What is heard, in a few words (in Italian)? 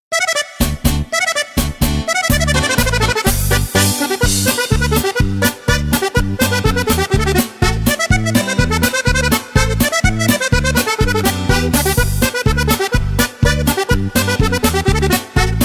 polka